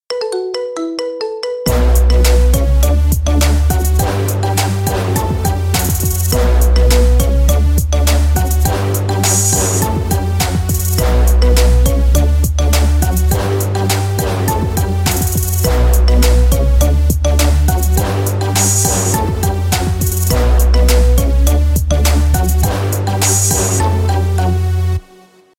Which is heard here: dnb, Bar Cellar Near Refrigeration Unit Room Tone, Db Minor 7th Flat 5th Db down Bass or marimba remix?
marimba remix